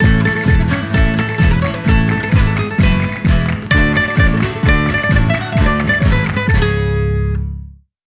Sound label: Pre-Fight; Don Flamenco